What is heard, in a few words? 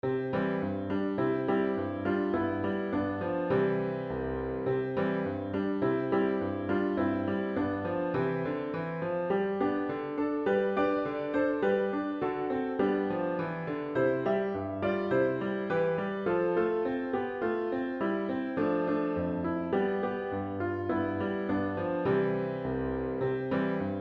Happy Song accomp.